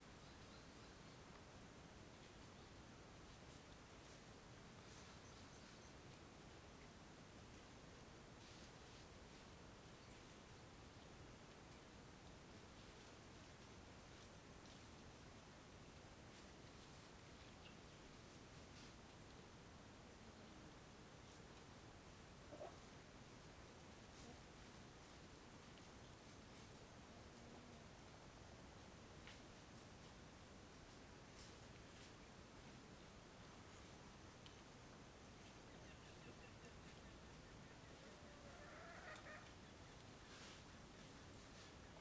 Ambiencia quintal em fim de tarde com galinhas, passos na grama e passaros; longe; ambiencia rural

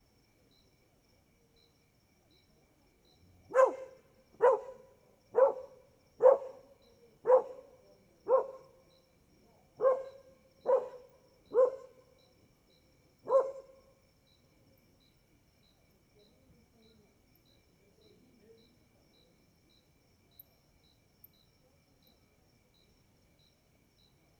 Cachorro passa latindo, outros cachorros latem longe bem depois, pessoas; animais

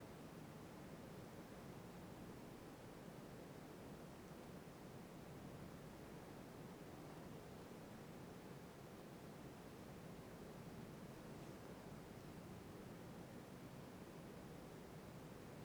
Interior de caverna silenciosa com rio longe; ambientes naturais; Coleção Sonora do Cerrado

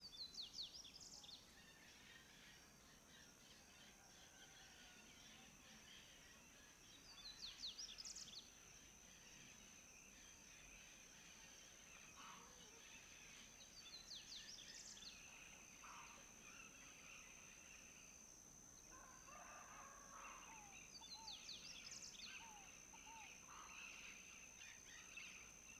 Ambiencia rural amanhecer passaros, cigarra e galo cantando; ambiente externo

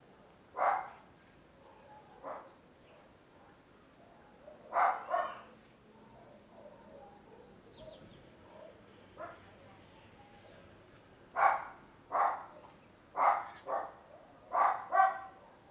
Cachorro de pequeno porte latindo perto de corredor; animais; Coleção Sonora do Cerrado

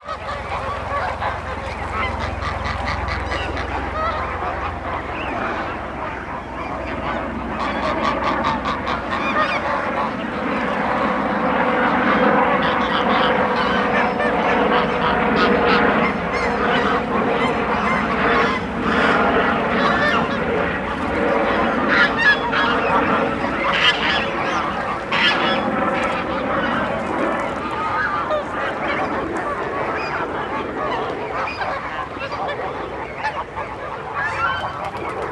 Helicoptero Passando Sobre Lago do Parque da Cidade com Gansos Gritando; maquinas; colecao sonora do cerrado

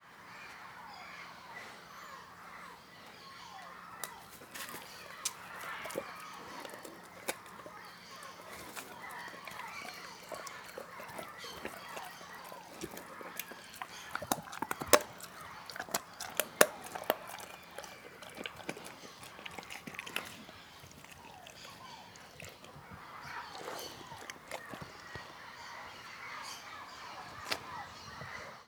Cachorro roendo osso e sons de passaros ao fundo; animais

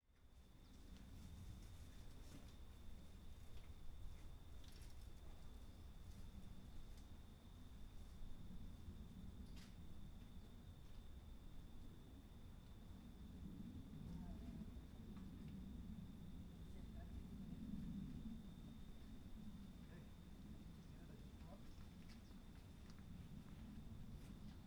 Ambiencia Rua Aviao Passando e Ciclista Conversando

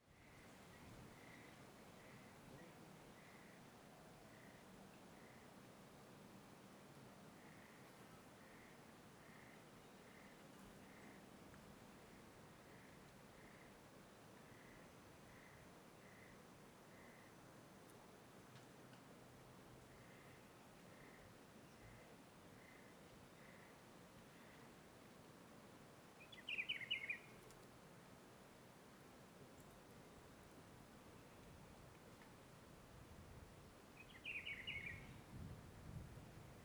Estrada de Planaltina com vento e passaro cantando dia; ambiencia rural

